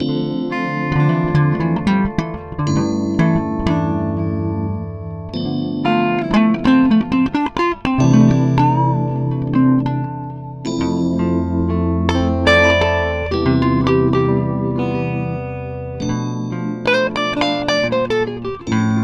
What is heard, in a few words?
Dm90 La Follia